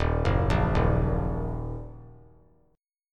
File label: Eb Minor Eb 3C 3F 3A 3D; Ebm arpeggiated; JGuitar